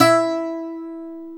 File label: SAZ E 3; Soundscan vol.53; Mediteranean Traditions; AKAI